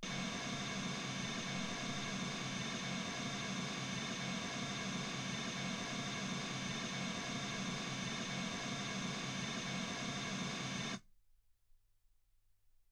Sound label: mls16 48kHz